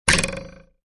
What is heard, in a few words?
Flipper